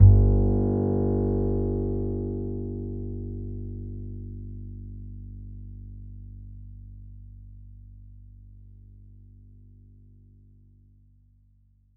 E, Upright Acoustic Bass; 1ST POSITION; Larry Seyer

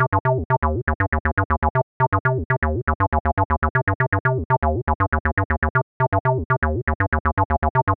120 Cm bass, bass loops, trance; Trance Explosion Vol1

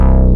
DM BAS, Dance Mega Synth; Best Service

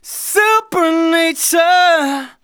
vocals sung F#; Techno Trance Essentials

